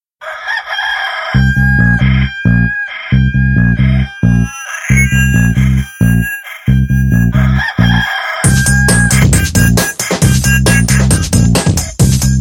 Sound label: ROOSTER ALARM MIX RINGTONE